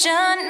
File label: vocal